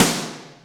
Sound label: SNARE ROOM; SNARES; Back In Time Records - Big Bang